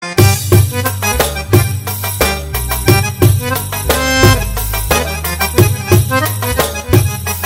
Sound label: arab accordion